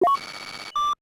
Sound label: Beep Start; SKY; IM-U450L; System Sounds; Camera; Video Rec. Sound